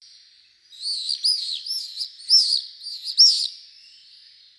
martinet pâle

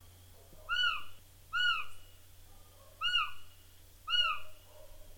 petit duc scops

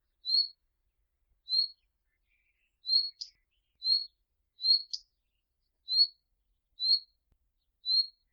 rougequeue a front blanc

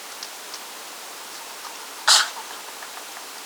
vautour fauve, orn

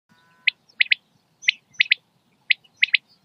caille des bles

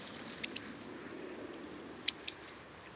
bergeronnette des ruisseaux

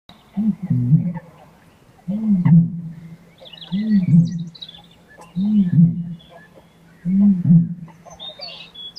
butor etoile

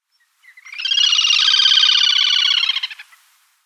grebe castagneux

